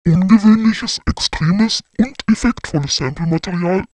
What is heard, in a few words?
processor formant -12